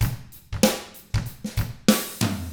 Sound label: 95 FUNK, Drums; ILIO; Double Platinum Drums 1